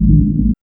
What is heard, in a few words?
BASS